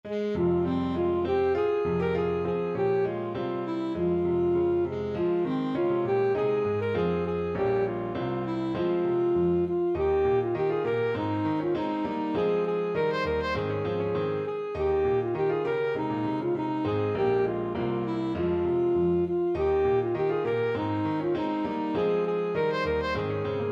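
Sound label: alto saxophone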